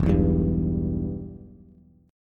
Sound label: E-Minor, Bass; strummed; JGuitar